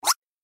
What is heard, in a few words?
Cartoon Skid; Infernal Radiation